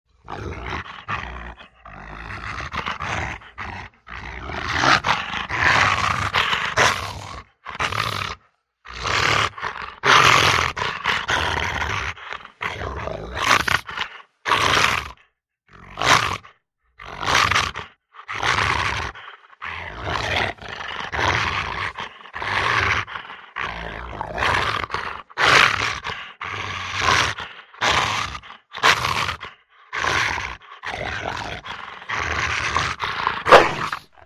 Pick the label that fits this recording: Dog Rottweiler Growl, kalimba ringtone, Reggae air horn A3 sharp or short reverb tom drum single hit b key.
Dog Rottweiler Growl